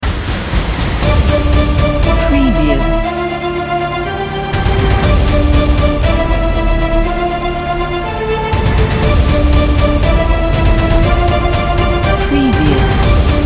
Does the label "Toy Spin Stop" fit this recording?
No